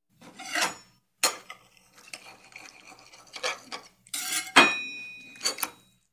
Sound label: Knife Cutting Knife, utensils